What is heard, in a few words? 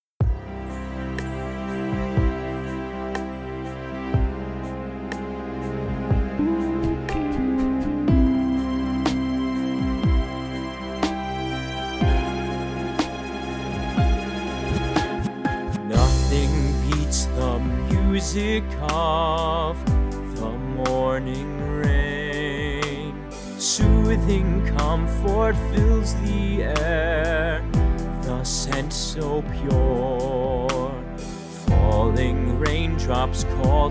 vocal